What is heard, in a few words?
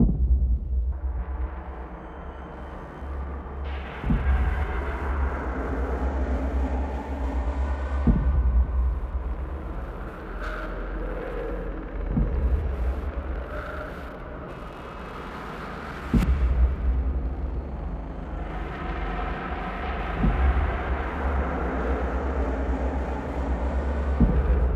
Enrage Drone; The Town